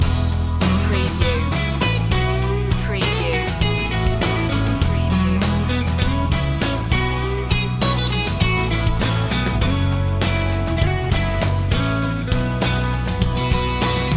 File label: carefree loop